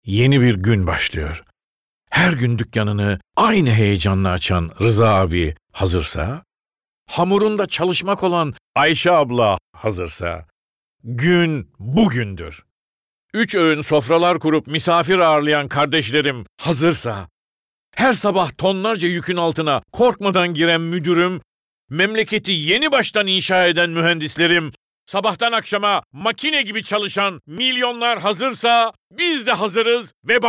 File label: Romantik